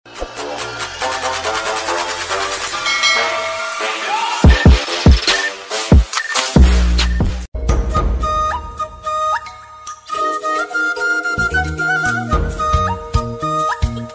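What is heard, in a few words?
flute bgm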